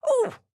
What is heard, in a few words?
AHurt